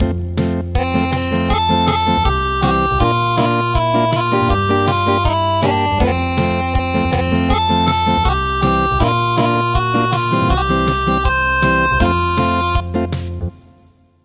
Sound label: Acoustic